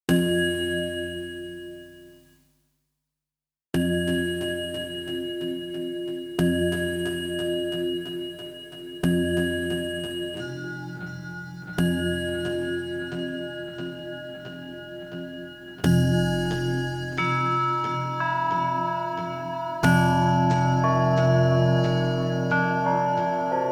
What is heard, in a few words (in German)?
Roland SDE 2000 Echozeit Verdoppelung